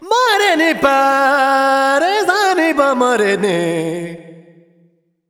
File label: Expression, Vocals Male World Pop